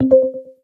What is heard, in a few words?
message; DiscordCanary